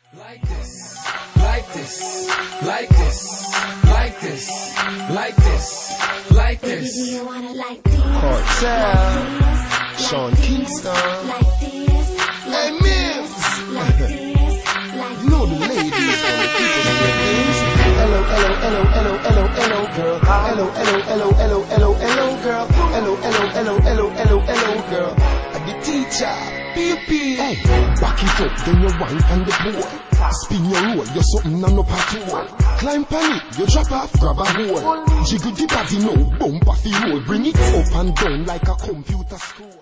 like this reggae remix

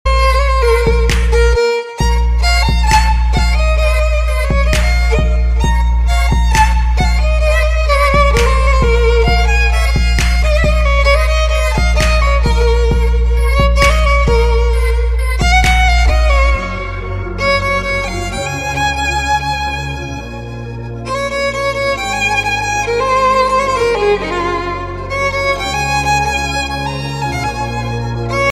violin

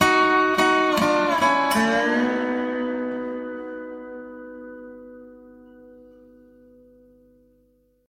Dobro Lick

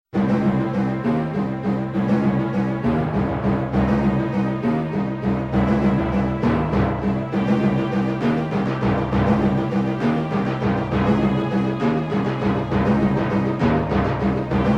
Marimba

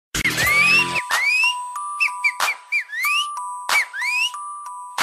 southside whistle

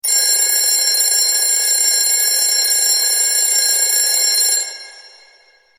Vintage Alarm

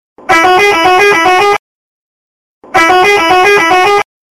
Telolet Tone

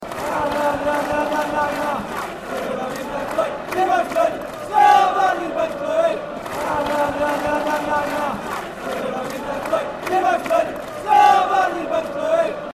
Wolves, ringtone

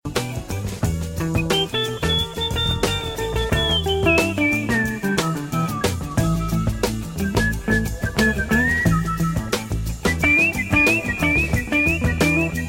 Whistle jazz

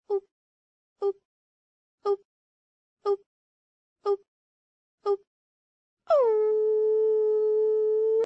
oo oop

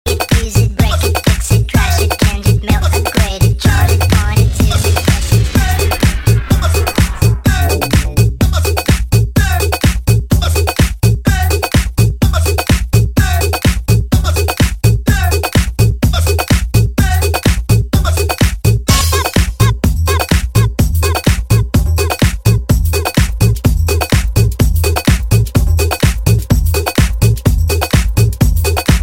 jump crazy beats

